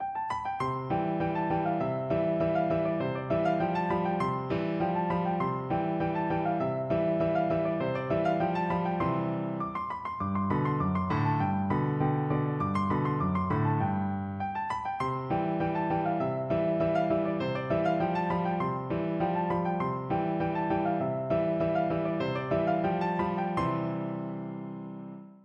seraglio duet, piano

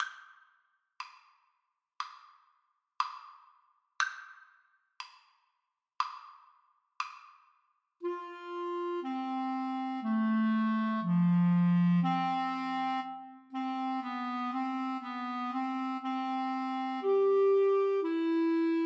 Clarinet Duet

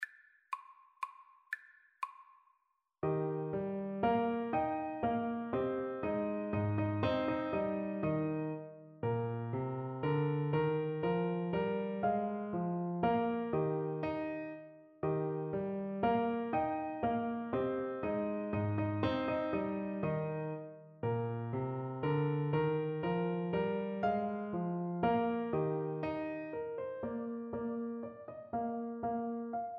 German Dance, Trombone

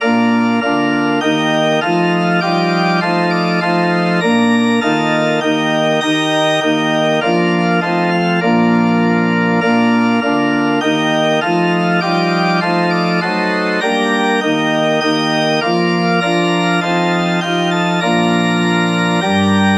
love divine, organ

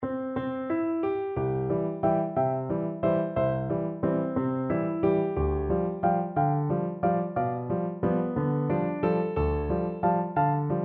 blue danube, piano duet

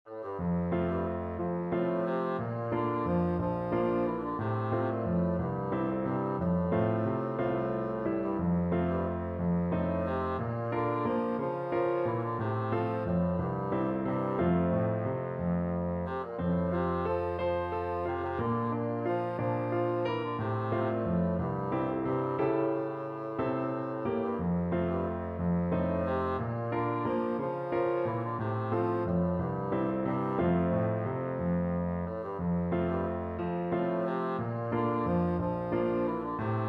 endearing, bassoon

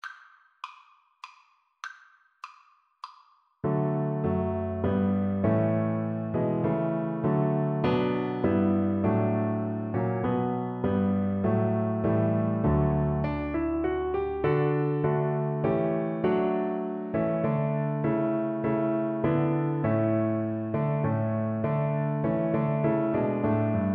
god save the queen, Double Bass

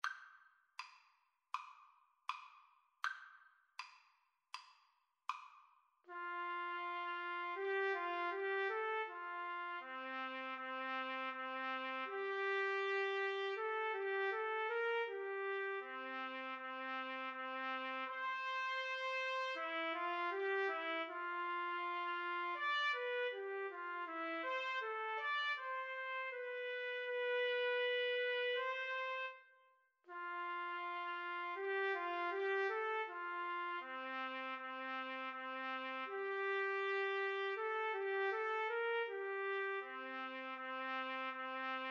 trumpet cello, Trumpet-Cello Duet

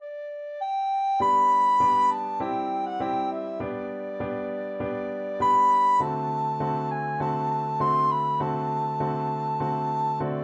recorder

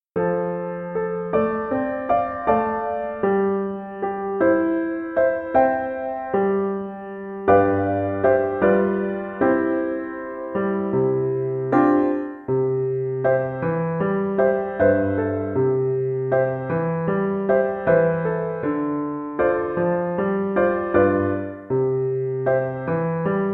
FL kar, Flute

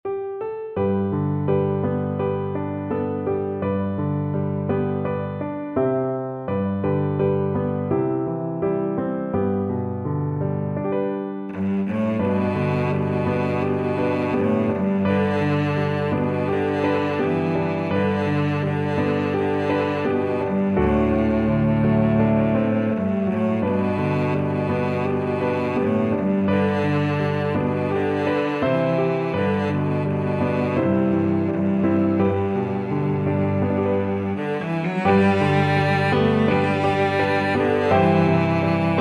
cello

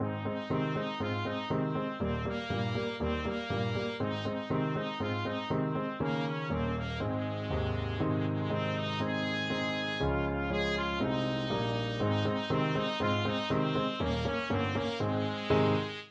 camptown races, trumpet

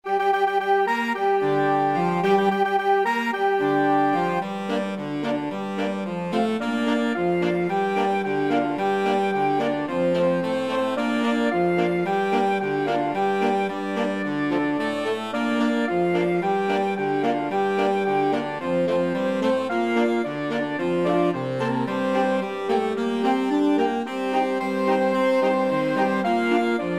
flexible mixed ensemble, 4 players

